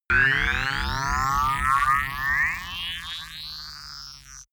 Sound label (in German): hover engine start, raumschiff